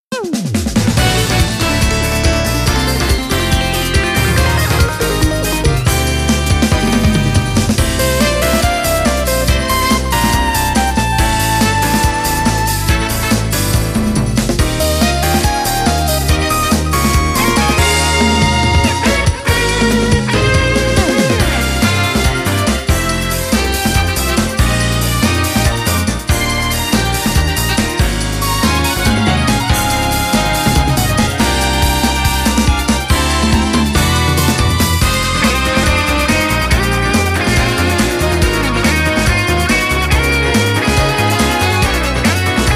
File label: Music Menu event